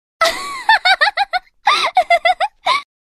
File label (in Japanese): エモ（笑う）「（笑い声）」